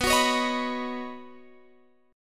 B Major Add 9th, Guitar, Open F; strummed; x,18,18,20,18,18; JGuitar

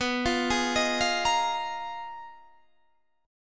G sharp Minor 6th Add 9th B, guitar chord

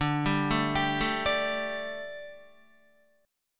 D Suspended 4th D down Guitar; arpeggiated

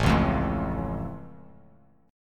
A Augmented A down; strummed; JGuitar

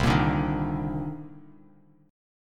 C Minor 9th, Bass Standard; Cm9 strummed; JGuitar

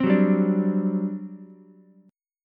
G Major 7th Suspended 4th, G, down, Ukulele; GM7sus4 strummed; JGuitar

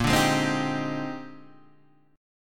A sharp 13th, Guitar DADGAD

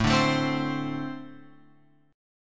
A-Minor Double Flat 5th A; Ambb5 Chord {5 5 7 5 X 8} strummed

